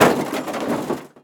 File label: metal drop roll